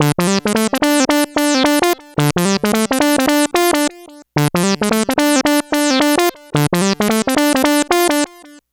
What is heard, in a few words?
Funk Line; Dr. Fink's Funk Factory